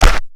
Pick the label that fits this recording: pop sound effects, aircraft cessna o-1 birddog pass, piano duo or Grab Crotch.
Grab Crotch